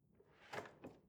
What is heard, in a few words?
drawer large draw soft